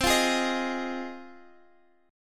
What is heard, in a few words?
Ab 6th, chord